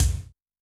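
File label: bass drum Gate, Kick, Yamaha DTXpress-II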